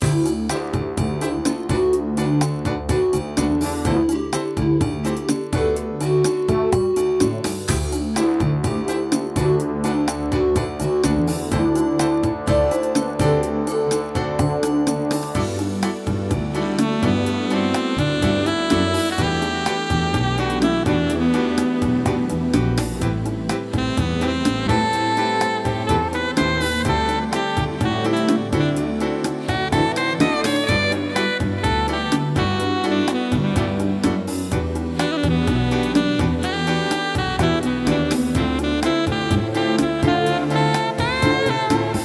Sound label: Afternoon Sax